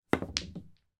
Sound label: sport miniture snooker pool ball hit with cue strike other ball